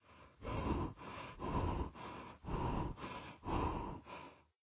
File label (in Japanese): 荒息; misao gamerip